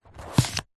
board game game card remove slide out from pile stack; telstrations